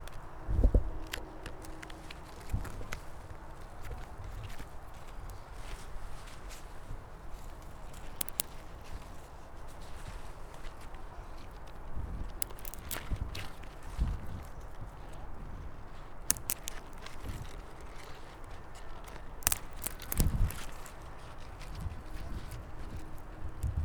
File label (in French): crepitement; campus universitaire de la bouloie